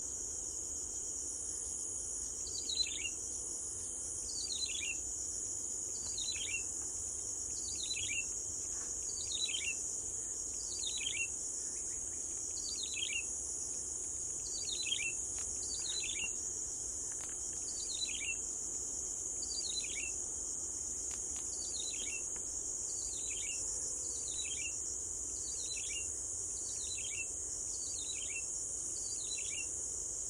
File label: prinia flaviventris